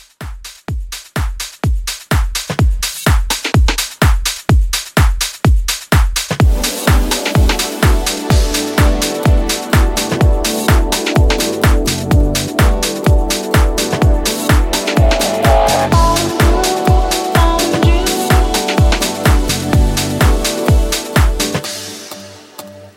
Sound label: Classic Dance House 126Bpm